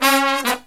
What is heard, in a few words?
Brass Riffs